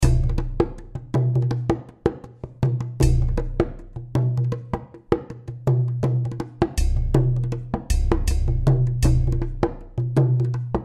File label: Tribal Hand Drum, Tribal Hand Drum Udu Rhythm Light Loop; Drums; Musical; Blastwave